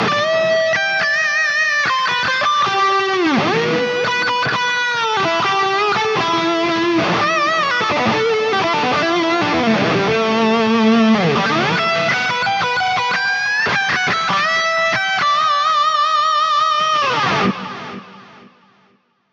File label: DNAfx Highgain Lead; Quad Cortex vs. DNAfx